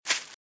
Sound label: Velociraptor - Footstep - Grass - Run - Right